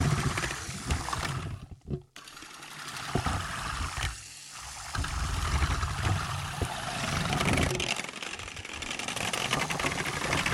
Geräuschewerkstatt Boot lang; Klangexperimenten

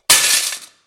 lauter Knall kurz; Geräusche zu den Klangexperimenten

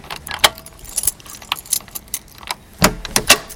Tür aufschliessen

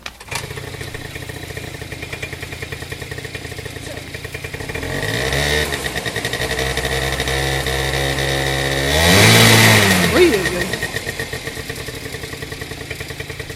Motorroller; Geräusche zum Hörspaziergang und erstem Schüler-Podcast; Klosterbergschule; Klangexperimenten

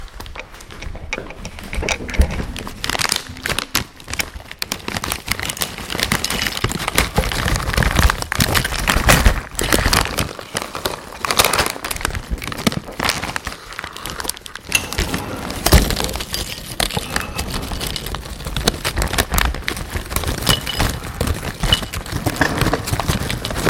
Chipstüte ausleeren; Erdkraft

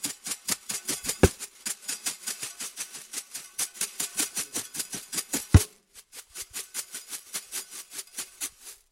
Geräuschewerkstatt Rassel; Rasseln; Klangexperimenten

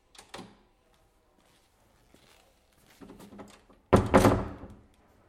Tür aus Holz öffnen durchgehen schließen; Holztüre öffnen, durchgehen schließen (leise)

